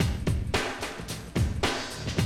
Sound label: Classic DrumLoops, 110